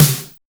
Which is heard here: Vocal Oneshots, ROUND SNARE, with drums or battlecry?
ROUND SNARE